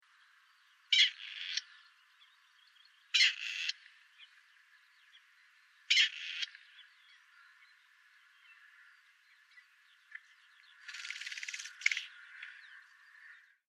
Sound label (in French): perdrix grise